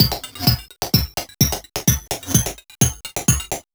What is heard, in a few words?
VFX2 Loop 128 BPM